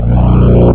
spawn; Glypha II 1.21

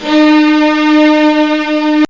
cellos, strings; Zoom - Release 2; Active Software; akai

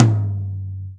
Tom, Real Drumkit; FruityLoops

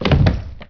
CHUTE; Dkedemo

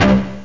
dirt guitar

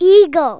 EAGLE; animal fun

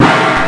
clang; shadow

